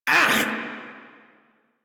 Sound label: Pain Lich D; Kuva Lich